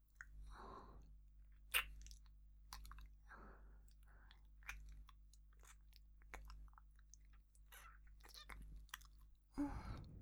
耳舐め(左)

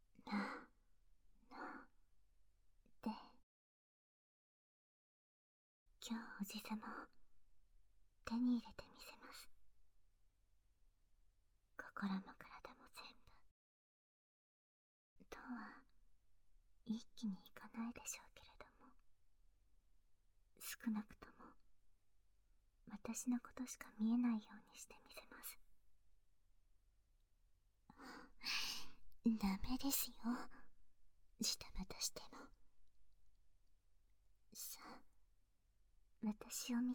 耳舐め

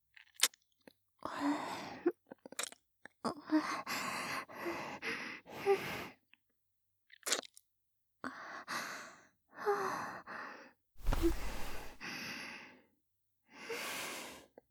キス

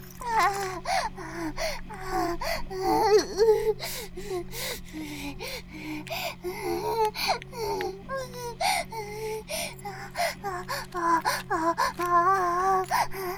バイブ放置 半イキ; イクたびにわけて

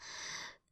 ブレスミディアム

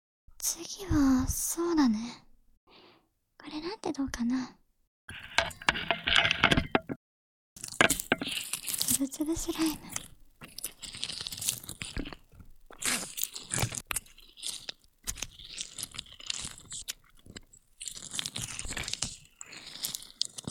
つぶつぶスライム, ASMR

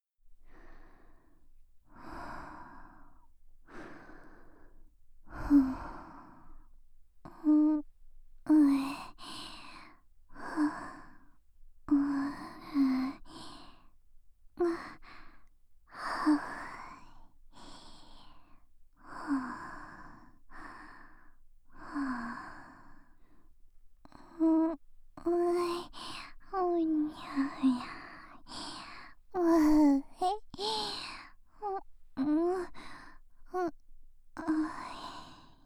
寝息ボイス(右側), ASMR

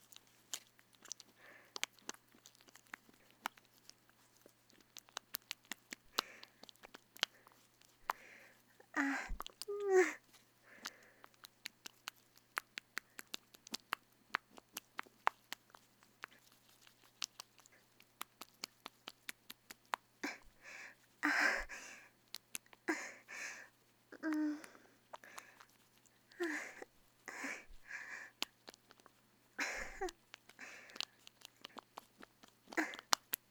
くちゅくちゅ生録音 くちゅ音のみ; 絶頂まで

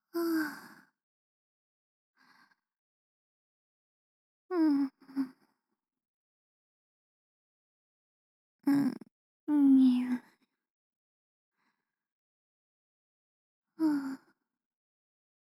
寝息「中央」; パチュリーの赤ちゃんになる魔法; 白パチェの寝息

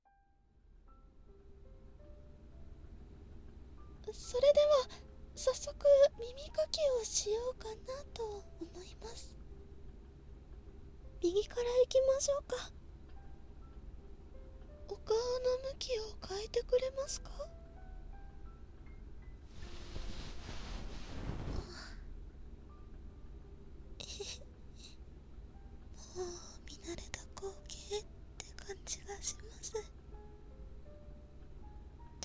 右耳かき; お隣さん冬

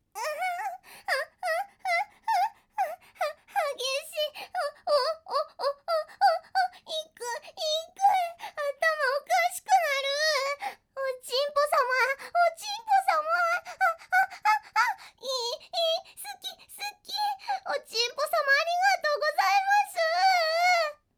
バイノーラル あぁん♥あっ♥あっ♥あっ♥

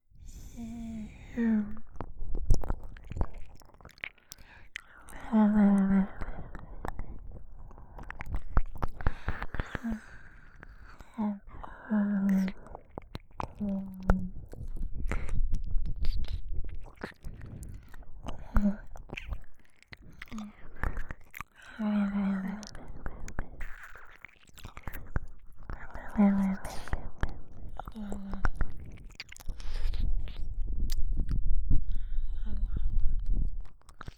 吐息いっぱい耳舐め男の娘両耳

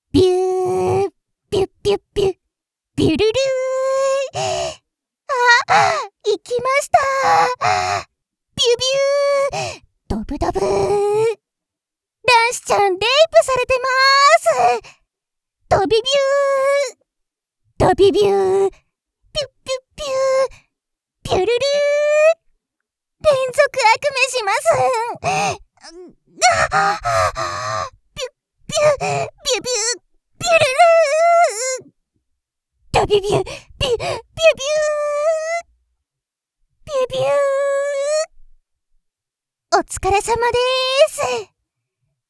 びゅ～～♥びゅっびゅっびゅっ♥

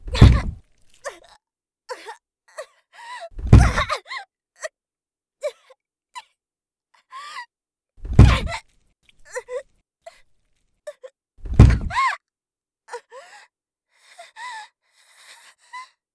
顔面を蹴り上げる; 効果音あり

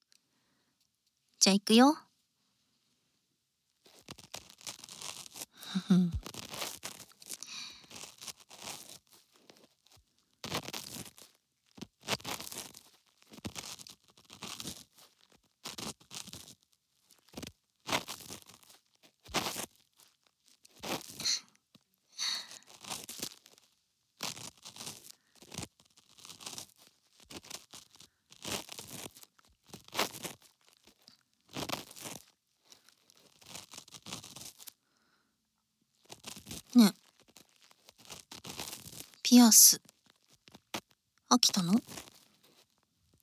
耳かき(右耳); いつも結局甘えてくる親友の耳かきをしてあげる私

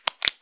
ゆっくりめ, ぱちゅ音単発

